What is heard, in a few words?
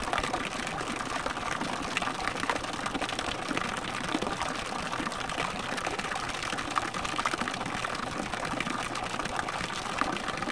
water, soundscape